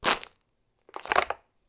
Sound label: crash PET